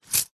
purse pouch leather coins inside tilt coins fast movement; foley